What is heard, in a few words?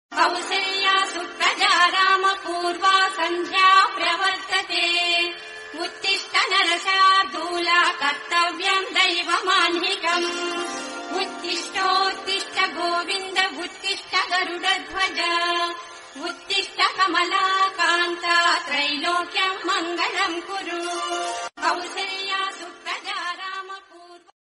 devotional mantras bhakti kannada; sri venkateswara suprabhatam